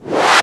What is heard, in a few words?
VFX Short Fills & Reverse